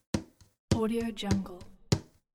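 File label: acoustic guitar body tap count in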